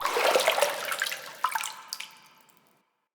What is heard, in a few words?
Pull Out Of Water; Player Instrument